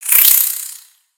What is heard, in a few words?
Spider Attack Melee; Lego; creatures mechanical